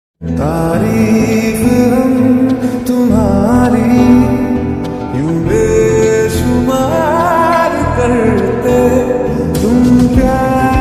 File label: slowed hindi ringtone